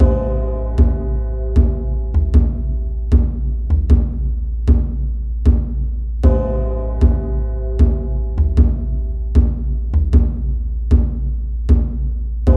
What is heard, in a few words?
BGM slow